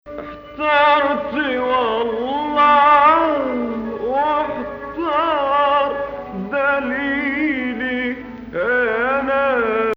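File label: Rast 2nd Ajam, Arabic music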